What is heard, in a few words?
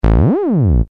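synth bass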